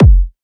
Kick, Techno, One-Shots, Drums; Ghosthack; Techno Sounds 2025

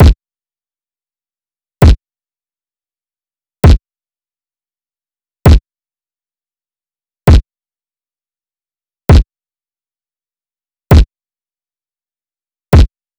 Drum Loop - 132 BPM Kick; Loop Stems; Cymatics; Vanquished Drum Loop

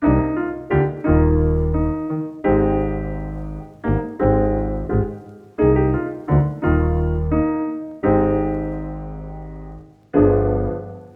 86 BPM D# Maj, Hip Hop Melodies; Soul Food; Cymatics

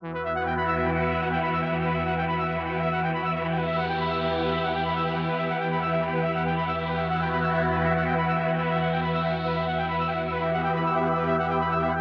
Wick 140 BPM E Min; Cymatics; 2022 Melody Collection

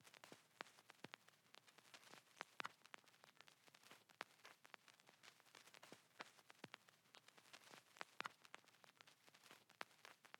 182 BPM G Min Vinyl; Loop Stems; Cymatics; Dom Perignon

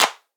Riddim Clap

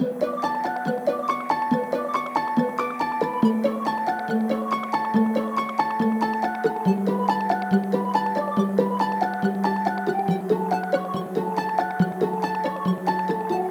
Arp Loop 140 BPM B Min, Future Bass, Synths

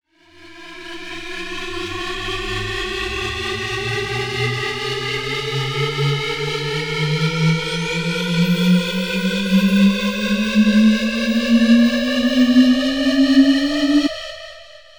Hollow Intensity Riser, 128 BPM E; House; FX; Risers

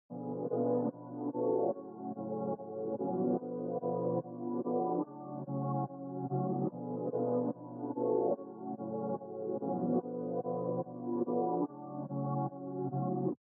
145 BPM F# Min Filtered Keys, Loop Stems; Melodies; Cymatics; 2022 Melody Mystery Expansion; Overdrive

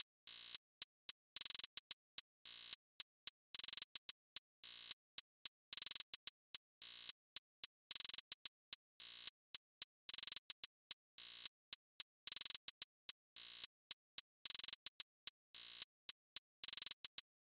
Drum Loop 110 BPM Hihat; Cymatics; Vibes II Drum Loop Collection; Avenue

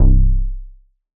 BASS Essential Donk - C, Synth One Shots

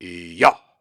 Grunts and Chants, Viking Vocal

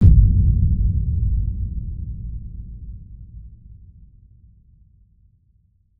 Hit

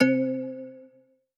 BELL Artificial (C); Melodic One Shots; Cymatics; GHOST (Beta Pack)

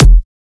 Kick, Dubstep; Dark Matter

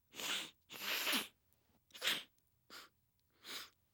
Cocaine Snort

